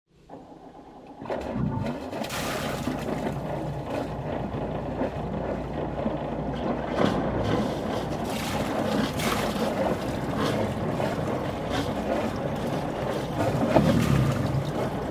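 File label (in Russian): заводится двигатель корабля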